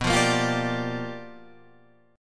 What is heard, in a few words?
G Minor 7th, B; Gm7/B strummed; JGuitar